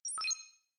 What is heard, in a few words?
Technological, notifications; Itel itelOS 15